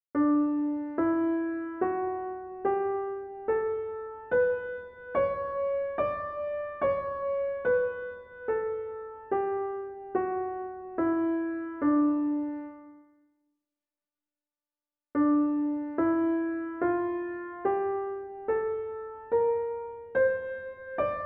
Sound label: scales